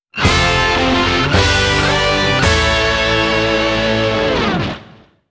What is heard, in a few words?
BGM Stage Clear; Dangun Feveron